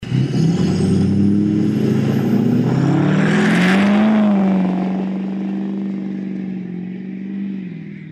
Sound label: lml straight launch